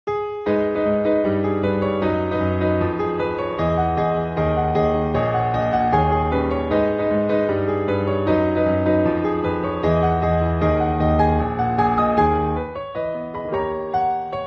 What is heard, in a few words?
piano